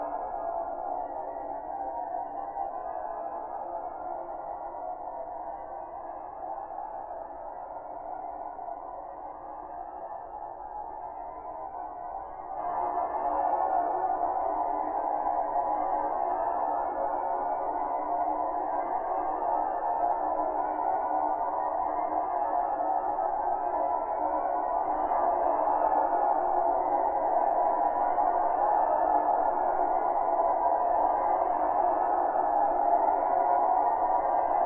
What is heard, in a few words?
Ambiance loop